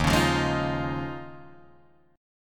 D sharp 7th Suspended 2nd Suspended 4th, Guitar, Drop D